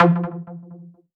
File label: Synth Cuts; Vengeance Samples; VES1 Instruments